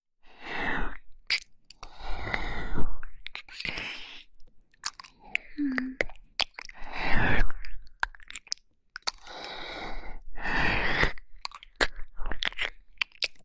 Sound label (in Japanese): 美少女の吐息と唾液が絡み合うエッチな耳舐めASMR